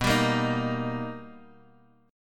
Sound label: B Augmented Major 7th B Guitar Open D, chord